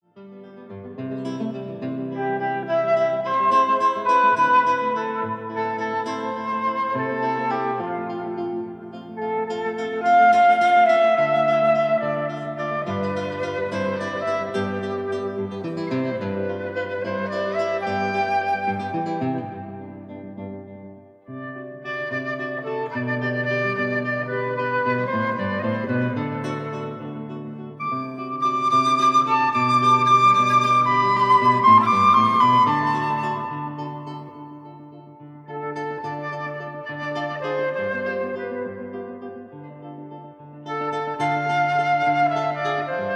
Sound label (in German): floete gitarre; schubert